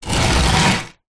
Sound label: household wooden furniture cabinet small slide tile floor